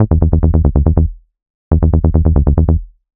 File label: ELECTRO BASS 140 A